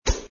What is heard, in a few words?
long jump; horsey racing